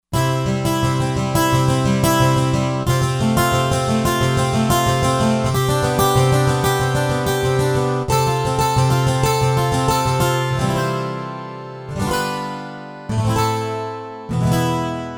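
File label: Guitar 18 String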